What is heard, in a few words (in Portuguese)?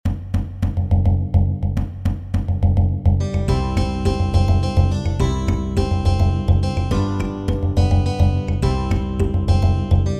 Amor e Luta Jongo RAPIDO